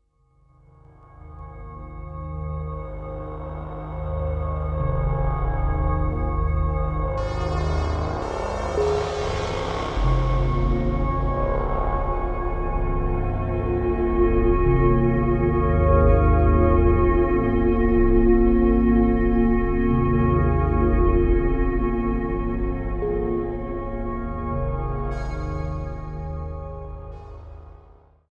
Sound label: Groan Wash; Curiosities Volume I